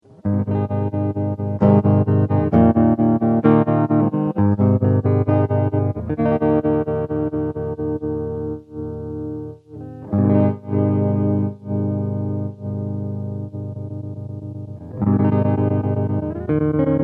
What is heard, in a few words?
Tremolo; guitar synth